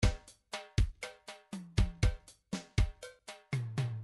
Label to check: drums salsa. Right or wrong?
Right